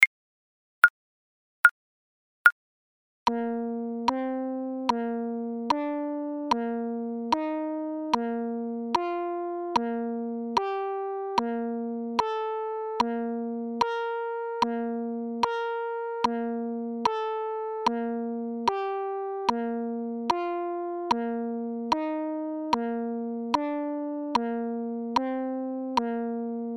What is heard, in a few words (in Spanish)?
Entonacion Intervalos Escala Mayor Saltos